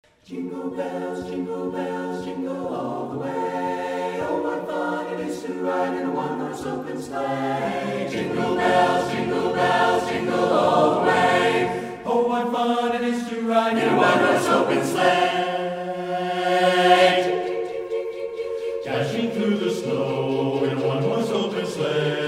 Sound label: Jingle Bells, a cappella